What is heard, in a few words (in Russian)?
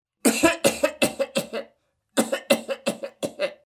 zvuk kashlja